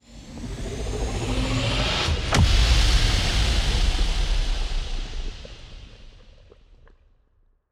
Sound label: toxic planet hit; weapons; Stellaris; toxoids